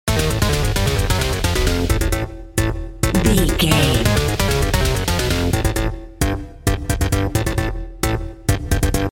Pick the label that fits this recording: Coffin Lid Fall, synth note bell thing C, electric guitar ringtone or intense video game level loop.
intense video game level loop